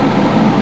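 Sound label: idle growl loop, engine; Quantum Redshift; XBOX; tara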